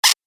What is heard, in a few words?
Robot Rusty Joint; Robot Sound Effects; Sci-Fi Robot Sfx